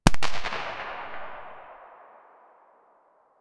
un seul tir de fusil, large room

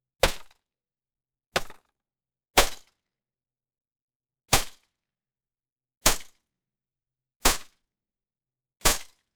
un arc qui tire assez

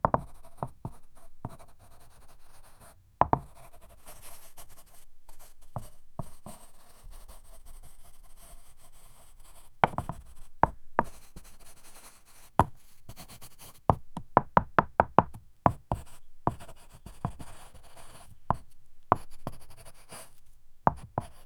bruit de craie sur un, school

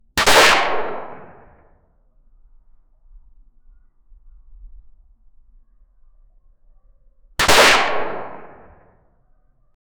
un seul tire de fusil, indoors

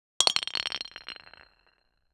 une pierre qui tombe, rocks

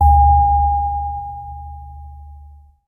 bruit de clochette grave; musical instrument

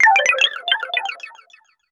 cree moi un sound design, boing

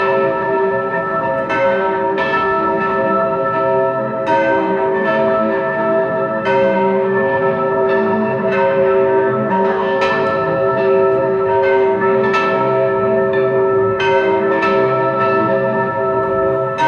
ambiance de temple hindou

